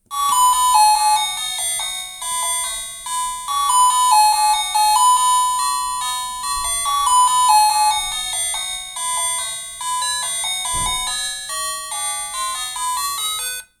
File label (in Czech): melodie